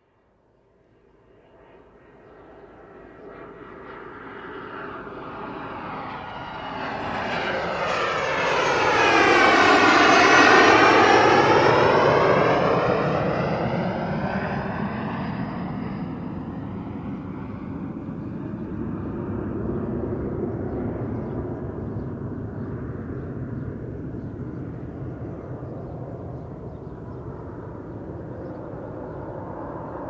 transportation aircraft military jet pass external, Fighter Jet Fly By; Vehicles; Airplanes; McKinneySound